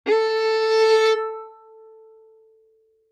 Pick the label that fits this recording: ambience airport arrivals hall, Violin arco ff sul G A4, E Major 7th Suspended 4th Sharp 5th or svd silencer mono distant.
Violin arco ff sul G A4